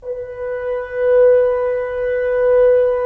BRS FHN, Solo F.Horn; Roland - LA Composer vol. 4

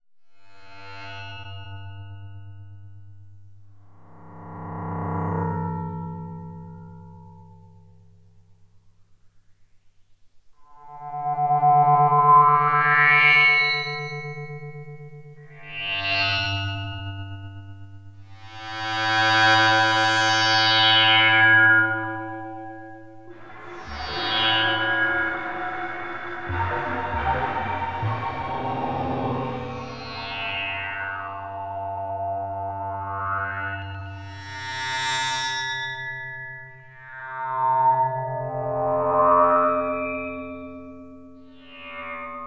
Processed Saws; Sonic Foundry (Sony Creative Software); Loop Noir; Session 08 - Psychic Investigator

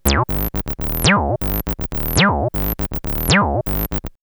303 Loops; X-Static Goldmine

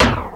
RX5 FM P; Hollywood Edge; The Big Bad Beatmachine

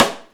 RIMSHOT, Ragga & Dub Reggae; USB Soundscan vol.27; AKAI

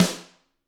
GRETSCH SNRR, Drums; ILIO; Double Platinum Drums 1

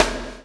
CHAIN; Spectrasonics - Backbeat

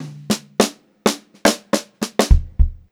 Funk Groove Drumloops; USB Soundscan vol.38; AKAI; 90 s Sample CDs

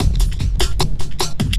LOOP, Drum & Bass, 150 BPM

